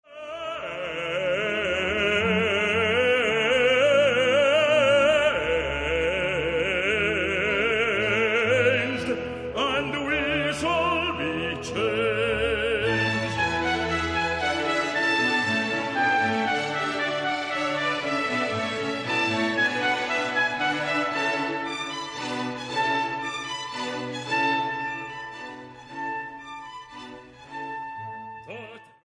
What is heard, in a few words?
the trumpet shall sound